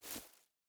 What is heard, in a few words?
footstep rubber boots grass jump start; Rust